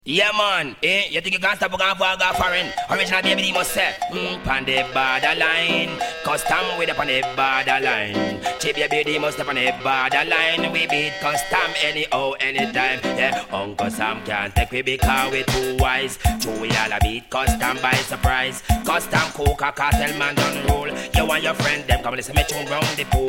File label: riddim